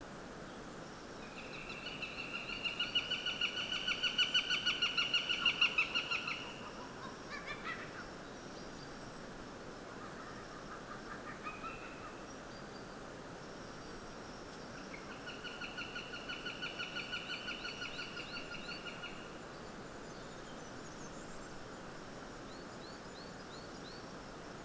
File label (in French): Epervier d'Europe, Issaux